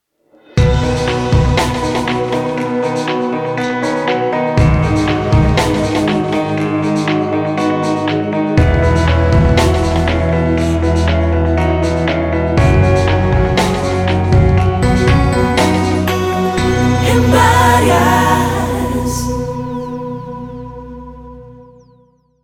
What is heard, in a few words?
brandsong institucional